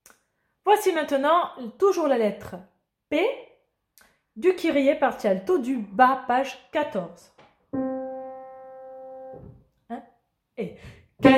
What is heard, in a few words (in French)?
Kyrie alto bas